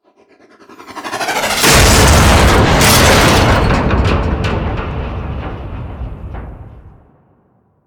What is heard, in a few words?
Crash; Vor; Liset; Warframe; Tutorial